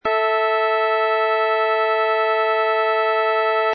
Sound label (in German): Quinte; werckmeister - stimmung